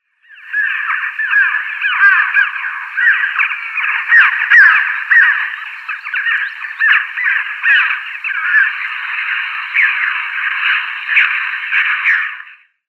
Chough nestlings

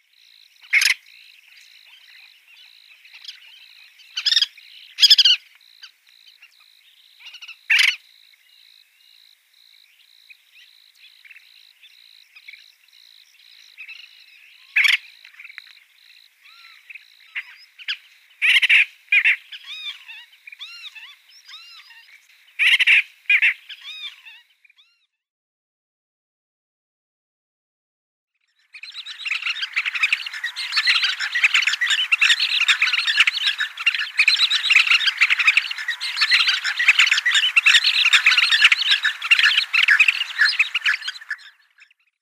Collared Pratincole

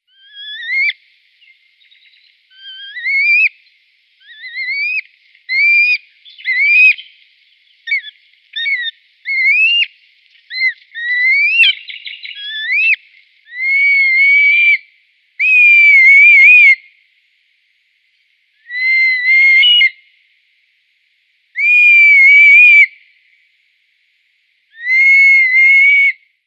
Red Kite nestling

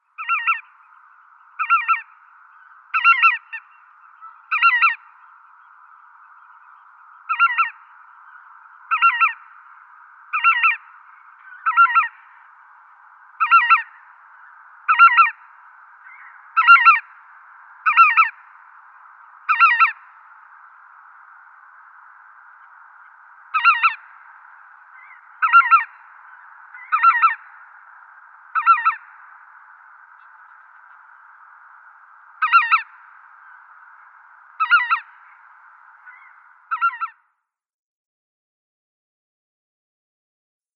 Lesser White-fronted Goose

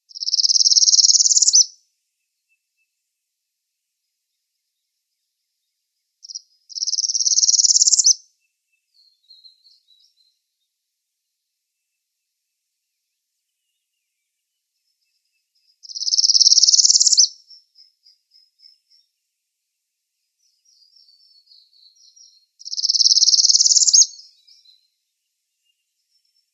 Northern Parula song

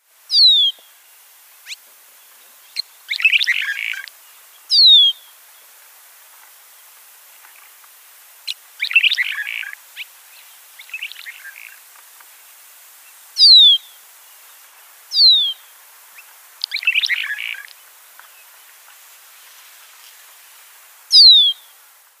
Red-rumped Swallow calls

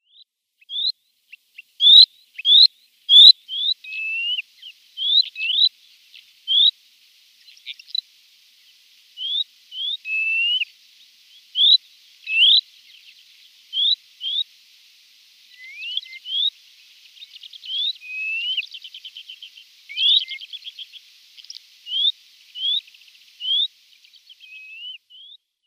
Thick-billed Lark flightsong

